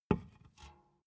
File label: big can filled put down hard